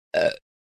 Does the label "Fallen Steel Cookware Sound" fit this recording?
No